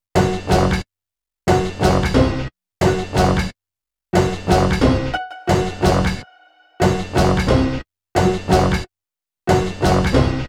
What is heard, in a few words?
Melody, Hip-Hop